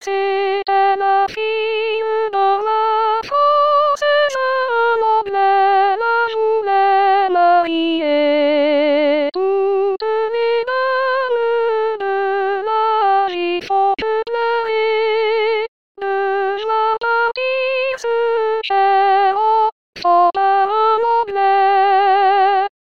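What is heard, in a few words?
soprano; fille roi francais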